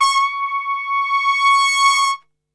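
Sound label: C#4 trumpet, Brass Super Section; TRP SWL10; Best Service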